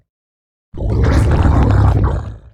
zerg variation; starcraft ii 2010

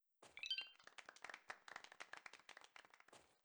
collect; battleblock theater

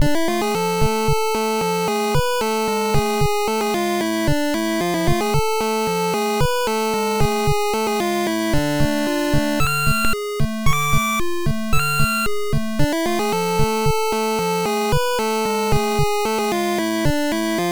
egypt music; 8 eyes

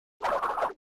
spin; Crash Bandicoot

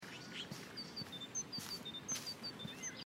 walking gras; life is strange

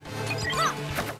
arms item

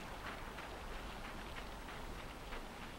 walk dirt; limbo; perception

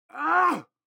heavy attack voice; for honor

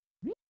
run jump; jazz jackrabbit 2

